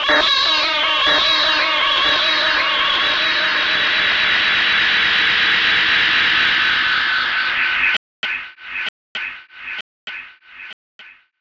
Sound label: Rhodes FX; EZRollers